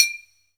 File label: Bottle Hit; Zero-G Jungle Warfare 1, 2 & 3; 90 s Sample CDs